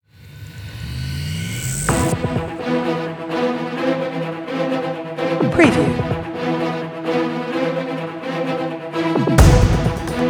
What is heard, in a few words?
Cinematic Trailer Epic Orchestral Strings Action Film Soundtrack